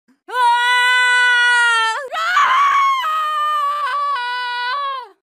impaled scream; mk9; sonya blade

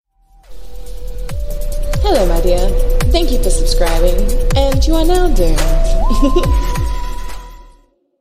song

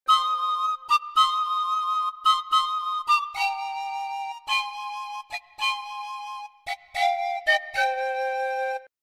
andean stroll panpipe

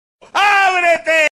ringtones; don francisco abrete

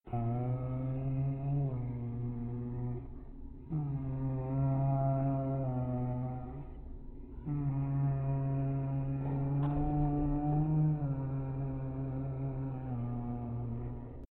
demon ahhh

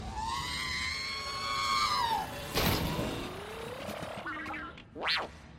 r2 scream

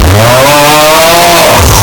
aaaaaaaaargh

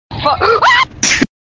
yelling

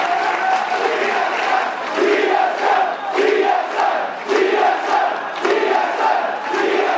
crowd reaction; tsm defeating vulcan in na lcs semi finals

